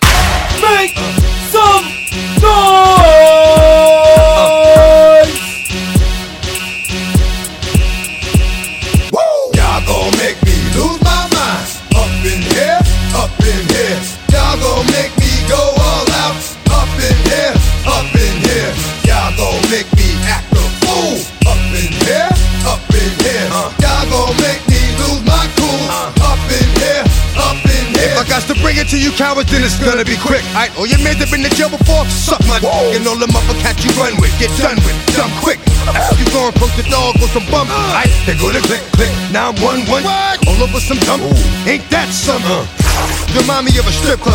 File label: Urban Throwback